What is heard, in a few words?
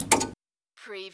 Servo Panel Sound - Switch TV